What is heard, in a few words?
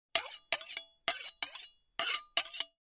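knife sharpening; Звук ножа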